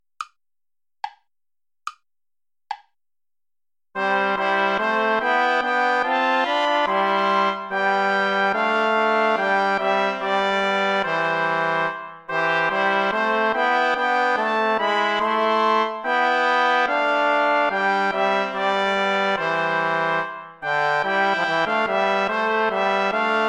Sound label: play along without trombone; praetorius michael; nach gra ner farb mein herz verlangt